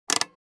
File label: Rifle optic adjustment; assault rifle